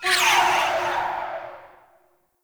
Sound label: Rifle Charge Projectile; Zariman Grn Wraith